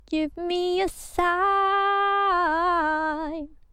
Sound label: Singing; IRySinging